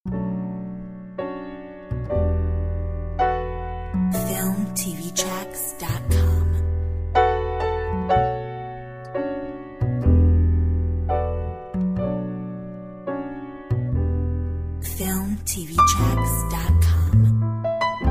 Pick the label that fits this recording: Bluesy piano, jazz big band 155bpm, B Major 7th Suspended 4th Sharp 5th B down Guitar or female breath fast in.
Bluesy piano